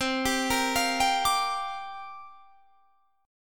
C 7th Suspended 2nd Suspended 4th C down Guitar Standard; arpeggiated; capo 9